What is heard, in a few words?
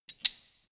wrench slide open; schrauben drehen hydraulik